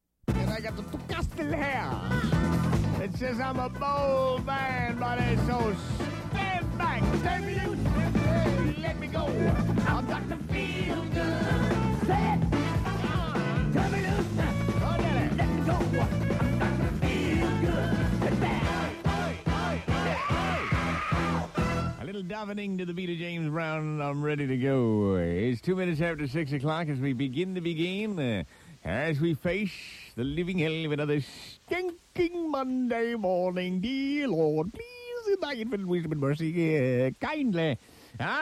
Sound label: Starting Old Car; Greaseman